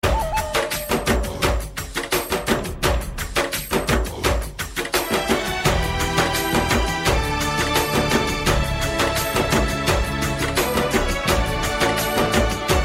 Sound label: Instrument